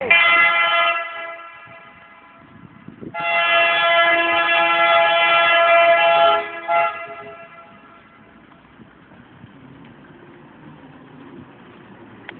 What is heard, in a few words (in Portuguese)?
Buzina; Comboios